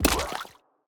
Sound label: Background Otter footstep